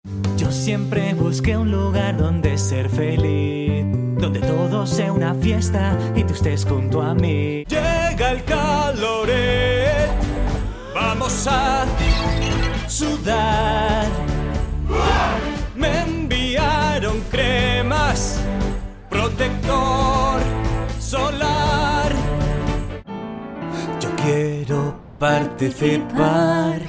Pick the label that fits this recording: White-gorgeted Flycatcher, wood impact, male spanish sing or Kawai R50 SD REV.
male spanish sing